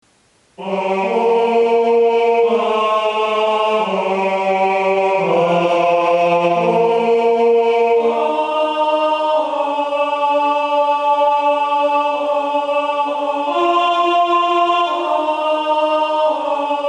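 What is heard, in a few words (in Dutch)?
tenor-basstem (cantus firmus); Sys willekomenheire Kerst; sintjanskoor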